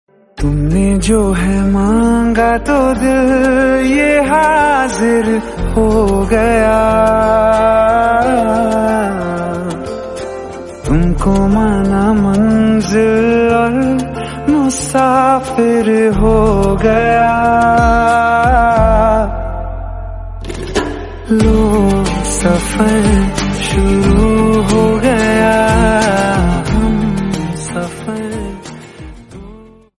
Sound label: heart touching, ringtone